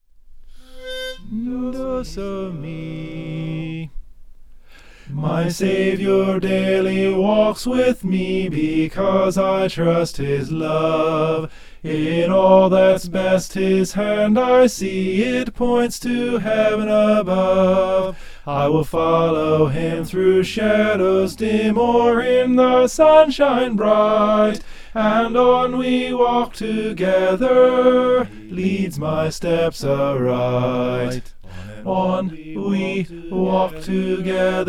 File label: on and on we walk soprano